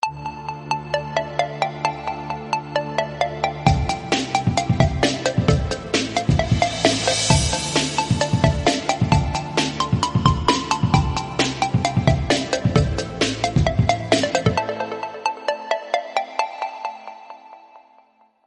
khong loi xperia z rise

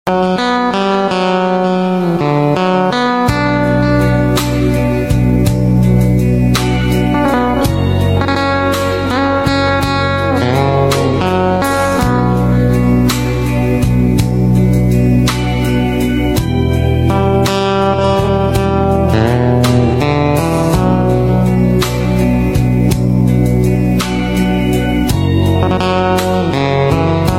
nhac chuong khong loi hoa tau guitar

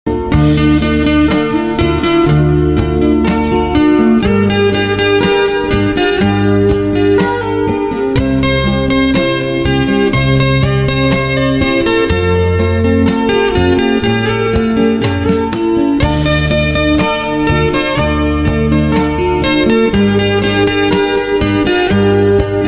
Nhac Chuong Guitar Ver

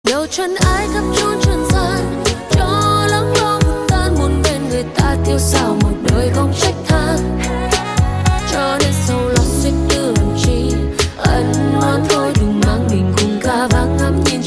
remix

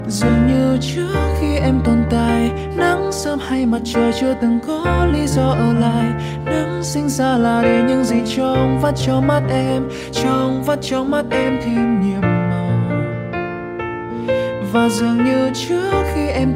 Nhac Chuong Piano Ver Cover

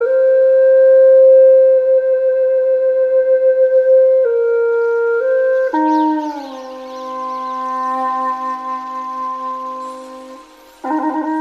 nhac chuong khong loi

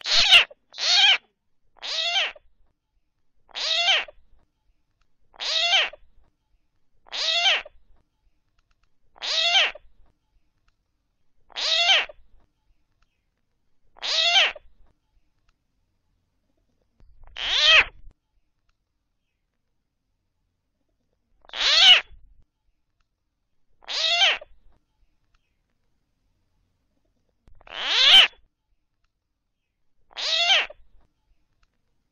Tieng nhai keu bay bim bip

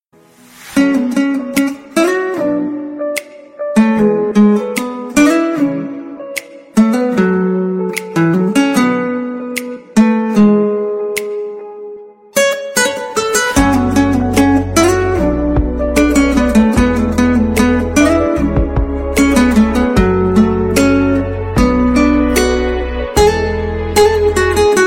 Guitar Ver, nhac chuong

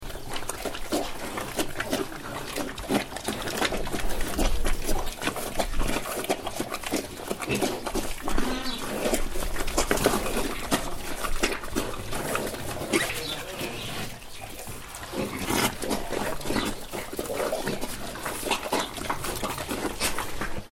tieng con lon dang an hi huc

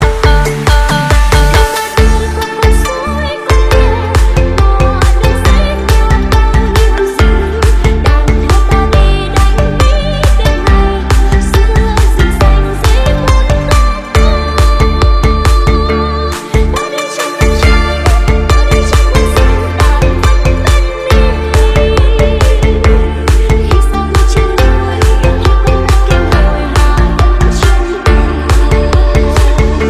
trữ tình remix

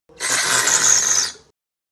tieng rit thuoc lao ngan; nhac chuong

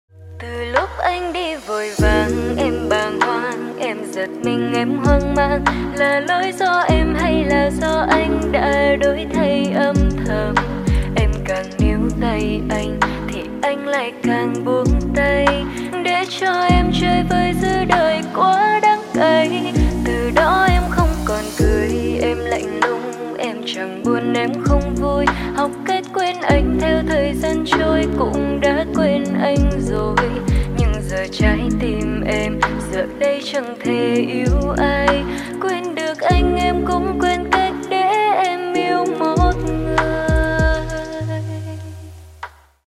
cover lofi giong nu

